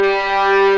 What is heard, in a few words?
G3 LEG, String Collection; East West; The Ultimate String Collection